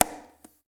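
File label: congas, right; Spectrasonics; Liquid Grooves 1 & 2; CONGAS+BGOS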